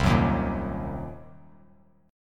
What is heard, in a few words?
C Minor 7th Flat 5th, Bass Standard; strummed; JGuitar